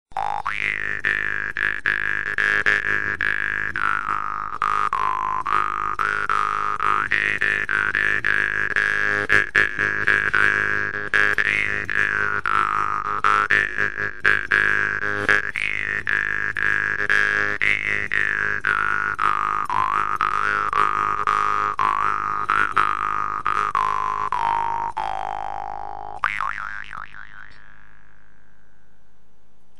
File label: Blue Dolphin, jewsharp; Gohring